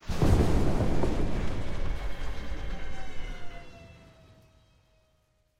Crash, Apache

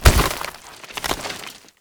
Body Horror Exchange; scpcb-godot

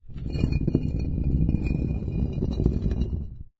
Ambient

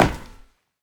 Run Metal; scpcb-godot

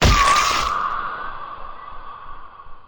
Damage

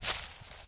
Step Forest

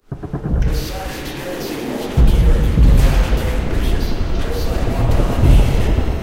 Alarm

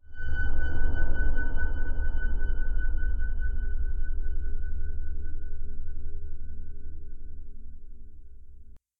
To Zone, Ambient

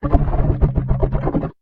Corrosion; SCP

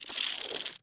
Rustle; SCP

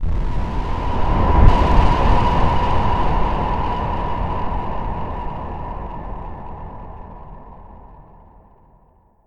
Explosion; Pocket Dimension

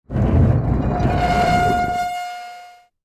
Door Open

